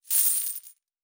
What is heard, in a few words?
Coins; Fantasy Sounds Bundle